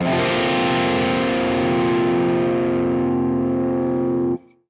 guitar chord; Summer Catchers

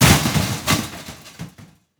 metal break

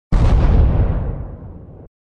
Sfx Explosive Bolt

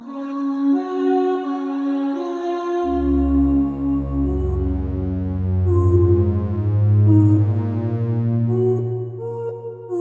Song Loop; 3DS

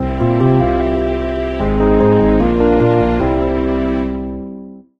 Sleep; Sex Simulator 2020

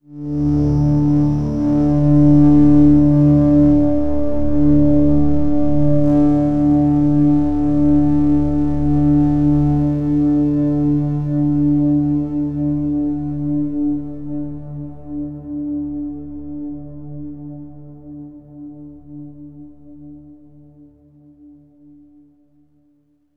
Overlay Drone; Act3 TheView

